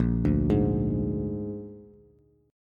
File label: Bb 7th Bb down Bass; arpeggiated; JGuitar